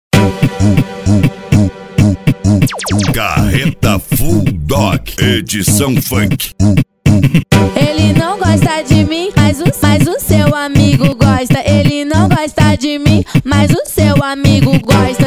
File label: funk